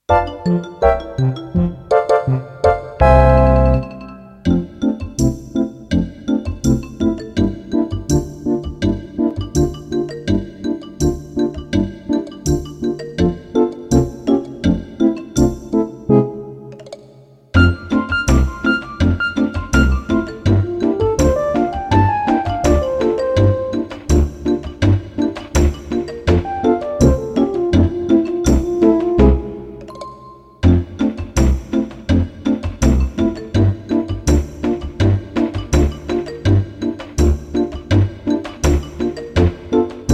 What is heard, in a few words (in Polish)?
wersja instrumentalna